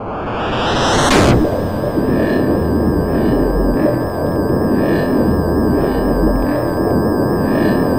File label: transport begin; space kitty